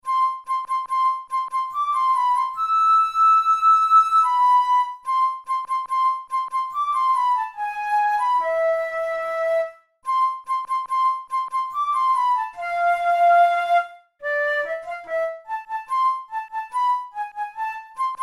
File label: studies for the boehm flute